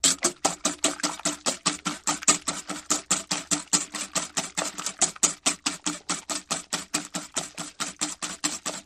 Beating Eggs Whisk, Beating Eggs With Whisk Mix

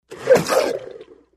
Blender Pulse Lid Pop

